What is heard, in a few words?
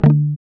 GUI D, Acoustic, Roland